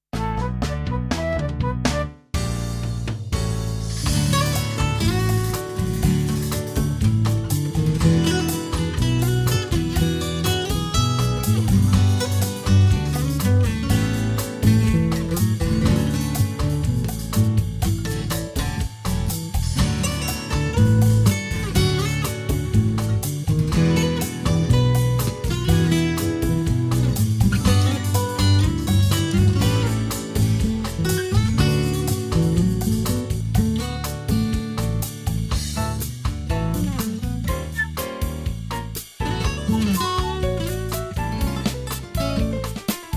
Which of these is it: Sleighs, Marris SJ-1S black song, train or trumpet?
Marris SJ-1S black song